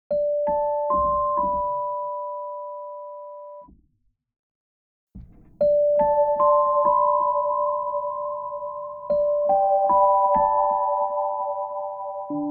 Vintage Oil Can Echo, Medium Rate, Regeneration and Time values create a warm ambient wash.; Dry and Wet mixed in Pro Tools; The Rhodes Less Traveled; Olivera